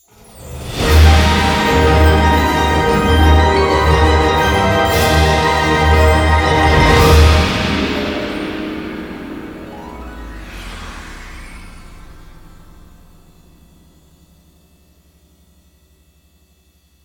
big Win; netent

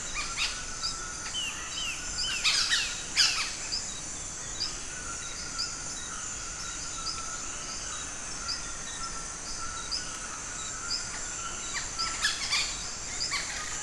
Forest Ambience; netent

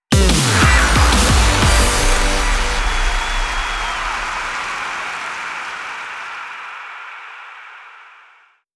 large Win; netent

